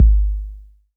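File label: bass drum 808 Tape Decay D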